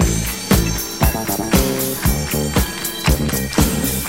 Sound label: full, Loops Phonky